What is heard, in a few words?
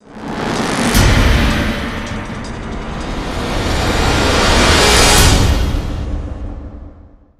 HIT STRING SWELL; gamerip